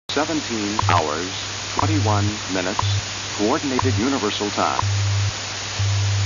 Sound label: distorted hours